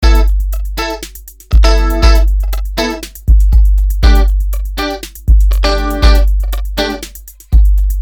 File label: depressing rock music